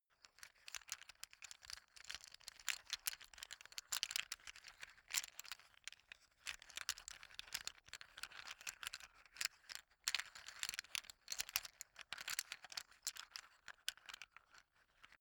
poker chips handle sequence soft

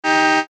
horn

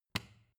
switch turn on hard

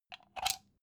socket pull plug soft

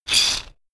shaker wood rough hit single hard

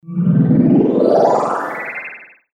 peculiar atmo

